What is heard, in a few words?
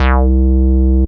Yamaha FS1r Bassline C2